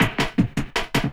LOOP SD; Ueberschall; Drum & Bass Resonance

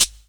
HAT; PlatinumPhattCD1; E-MU Producer Series Vol. 8 – Platinum Phatt

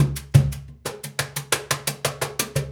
Cajun, Percussion, Drums; Essential Percussion

